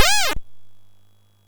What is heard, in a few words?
sid MOS6581R4